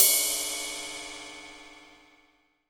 Crashes & Cymbals

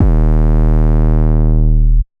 808 D#; Cymatics

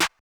Open Snares

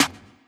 All I Know Snare

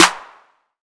Snareclap

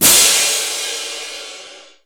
hit, crashes & cymbals

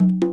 LATIN, Percs

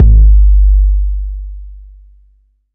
808's

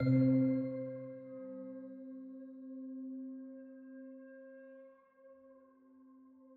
melodic vibe

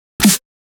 Snare 110 bpm

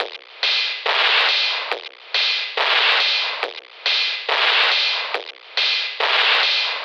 LOOP

